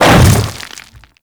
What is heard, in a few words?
Hammer Boss Step Dirt, footsteps; Warframe; Grineer Hammer Boss